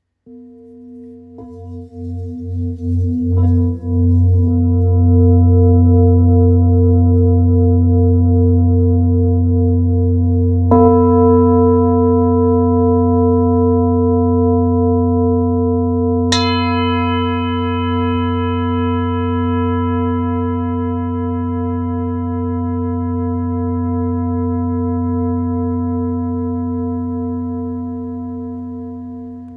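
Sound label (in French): Bol ramgandi fa diese, bol chantant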